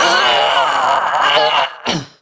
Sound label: Death, Barks; Kuva Lich; Persona C